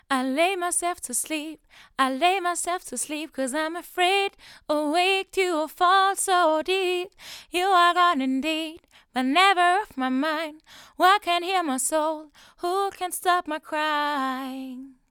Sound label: t.bone sc450 vocals